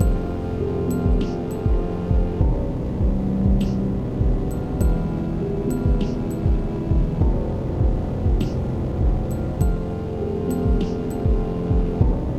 Halloween Music; Cloth; MiSide

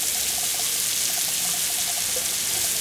Water Sink; Game rip; MiSide

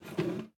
Chair Hammer Move; Game rip; MiSide

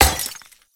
Mirror Broke; MiSide

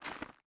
Step Snow; MiSide

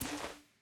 Cloth Dress; MiSide

